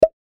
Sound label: chat